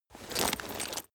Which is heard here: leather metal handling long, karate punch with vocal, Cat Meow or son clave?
leather metal handling long